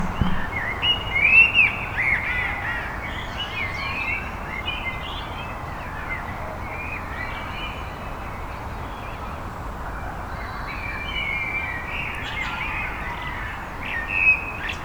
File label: City Garden Birdsong; Animals